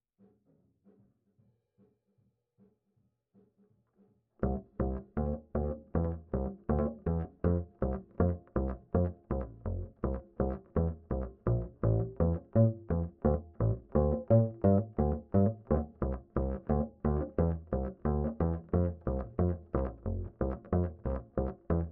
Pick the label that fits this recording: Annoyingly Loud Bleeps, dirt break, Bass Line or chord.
Bass Line